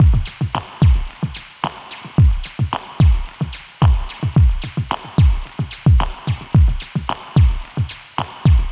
110 drum loop loading

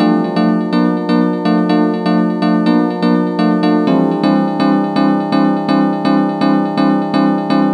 Chords

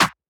Percussion

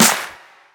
Big Snare

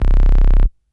C Synth Bass

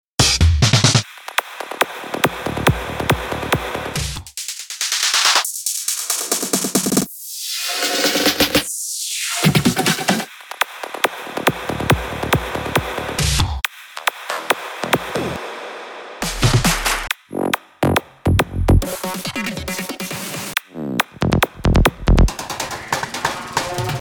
psytrance

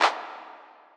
123bpm Clap

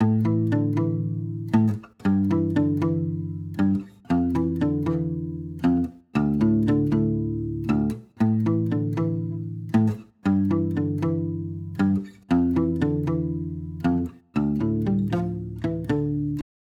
Guitars 117 Am

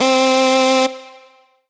Synth Hit

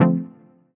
F Pluck Yeah

